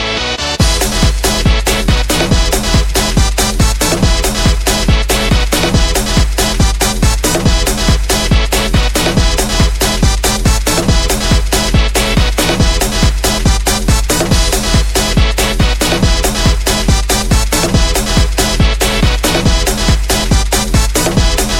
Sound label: dance mix